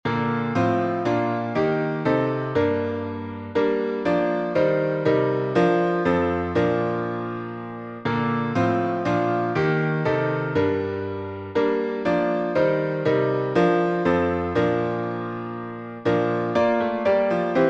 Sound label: All Glory Laud And Honor alt Chords